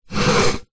household plant pot ceramic drag on wood laminate floor